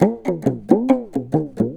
PAPANG, Indian Traditions; USB Soundscan vol.45; AKAI

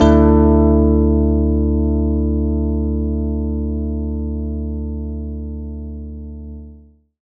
Rhodes Chord; Zero-G Jungle Warfare 1, 2 & 3; 90 s Sample CDs

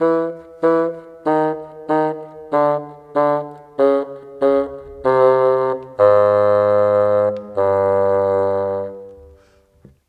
Bassoon, Orchestral, Rock Pop; Sonic Foundry (Sony Creative Software); Orchestral Series VOL-4; Rock and Pop; Rock-Pop 04

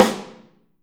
SN, Drums Percussion; CMB Kit 1

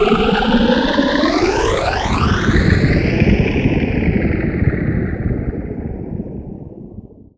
NOISE, Production Effects

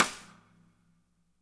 MED SNR, natural kit; Spectrasonics; Burning Grooves CD2

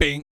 BASS FX; ILIO; Vocal Planet VOL-3; Jazz & FX

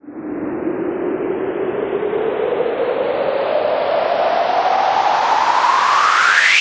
Sweeps Up, Effects

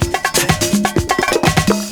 PERCS, 70 s Breakbeats

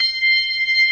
B3 C 6, ORGAN; AKAI S-Series CD-ROM Sound Library VOL-8

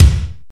80s Kick

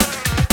DRIVEN RHYTH; Rarefaction; Poke in The Ear With A Sharp Stick

